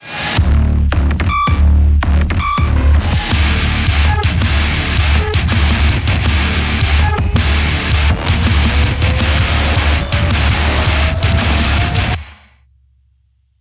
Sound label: heavy; Flesh & Metal heavy14